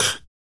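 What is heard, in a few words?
vox percussion, Vocal Planet Jazz FX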